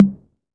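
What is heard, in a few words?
tom TR606, drum machines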